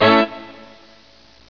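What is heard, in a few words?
HORN STAB A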